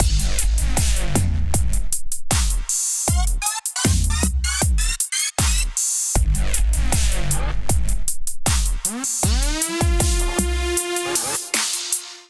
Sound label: groove 130bpm; Underground Rush Kit